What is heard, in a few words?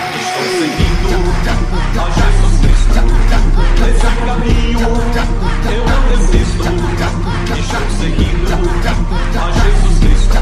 remix funk; show da fe